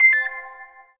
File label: Shutter; Omnia